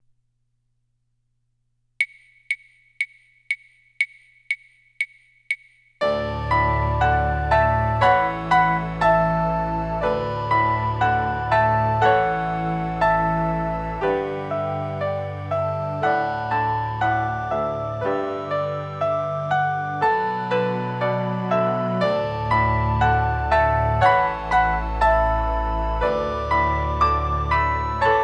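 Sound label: solo with accompaniment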